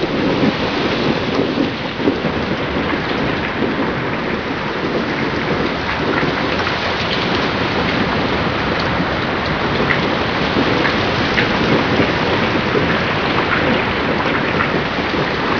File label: Rain Int, ambience